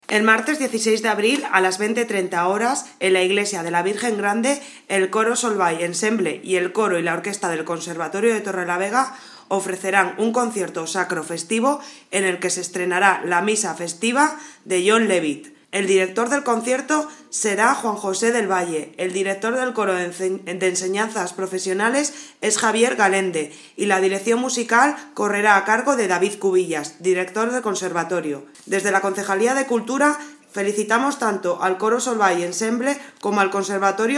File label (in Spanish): concierto coro, Concierto Sacro Festivo a cargo del Coro Solvay Ensemble y del Coro y Orquesta del Conservatorio